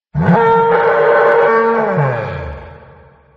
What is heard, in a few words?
Parasaurolophus Sound; jurassic hall